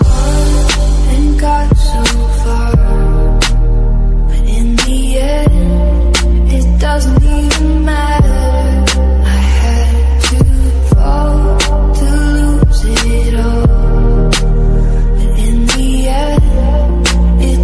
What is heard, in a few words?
famous ringtone, english ringtones